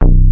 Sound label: Moog Bass Hit